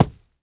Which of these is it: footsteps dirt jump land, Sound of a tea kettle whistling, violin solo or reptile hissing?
footsteps dirt jump land